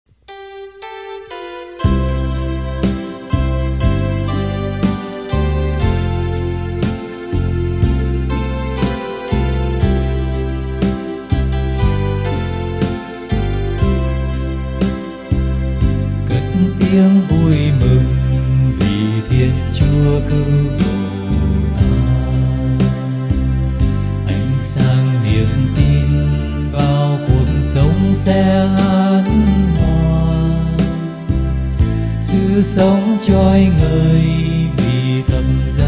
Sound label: bass